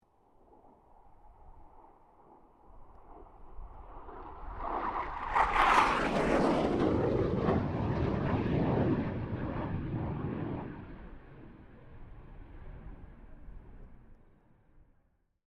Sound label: AIRCRAFT FA-18 HORNET PASS